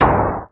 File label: noise fx; Masterbits; Switch FX